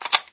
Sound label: m249 cover down, weapons; Source Engine; cstrike